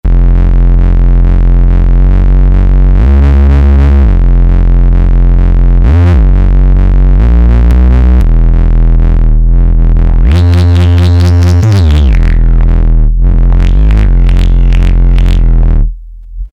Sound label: Angry Bass, Novation XioSynth 25